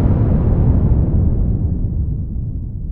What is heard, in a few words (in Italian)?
armi